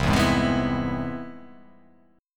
C Augmented Major 7th

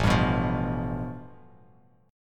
A 7th Suspended 2nd Sharp 5th, Bass Standard; A7sus2#5 strummed; JGuitar

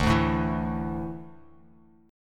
D Suspended 2nd D down Bass Standard; strummed

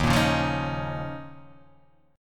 D sharp 7th Suspended 2nd

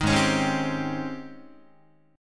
Ab Major 11th Ab Guitar; AbM11 strummed; JGuitar

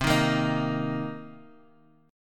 C Minor 7th C Guitar Half Step Down

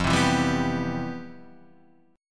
C Major 7th, F, guitar chord; Cmaj7/F strummed; JGuitar

